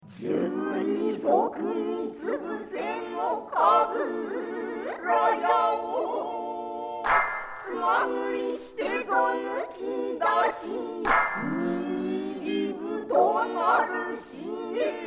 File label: example behringer fx2000 effect